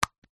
office pencil sharpener chamber plastic lid remove open; zapsplat